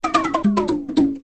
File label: conga